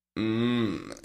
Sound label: Mmmmmm